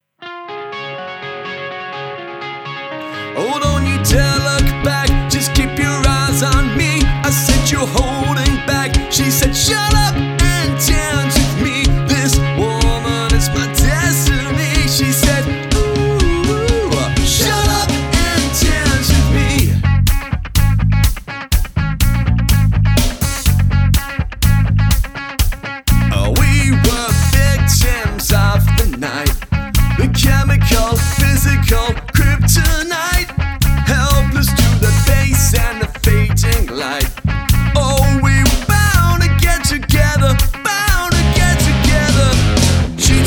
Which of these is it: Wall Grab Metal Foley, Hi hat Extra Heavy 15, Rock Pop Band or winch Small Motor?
Rock Pop Band